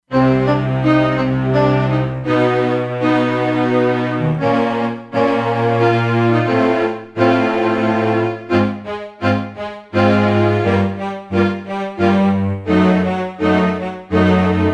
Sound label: Str Section; Factory Presets; E-mu Proteus-2